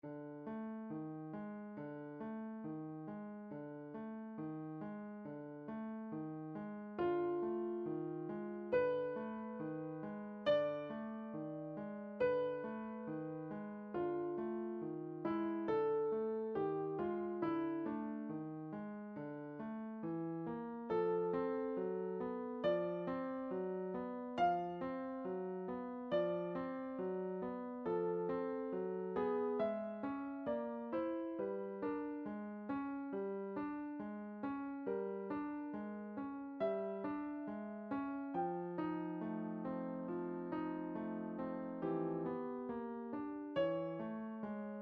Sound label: bartok ten easy piano pieces, midi